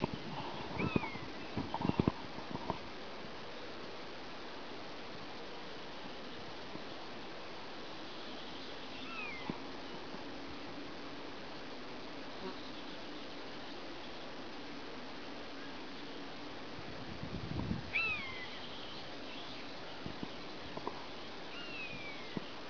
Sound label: honey buzzard calls; 7th June 2003 Northumberland